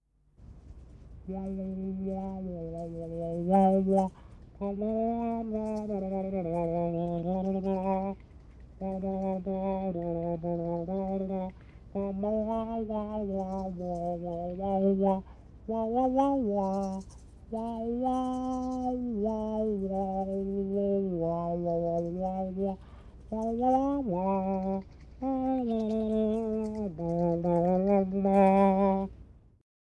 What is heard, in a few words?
BLUBBER